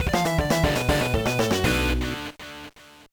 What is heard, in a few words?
Jingle, gba